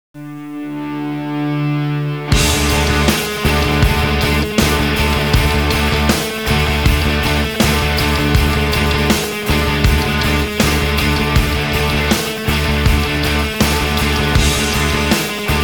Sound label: music